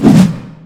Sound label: whoosh, large blade; weapons; dota